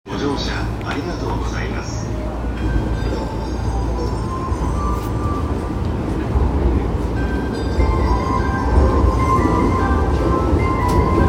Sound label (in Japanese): JR 湘南新宿ライン 渋谷 北, train sounds